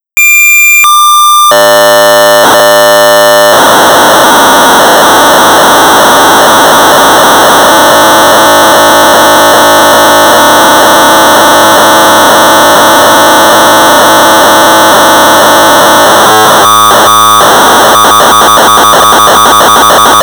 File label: Sorcerer 1200 baud; Home Run; J.T.Staley; BASIC